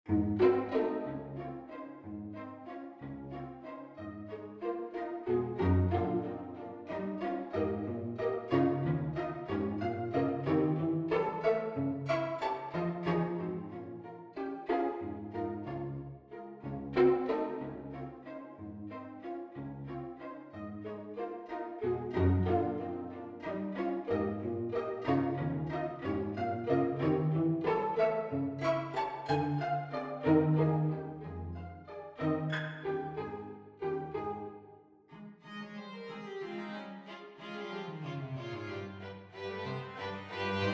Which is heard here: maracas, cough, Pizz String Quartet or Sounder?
Pizz String Quartet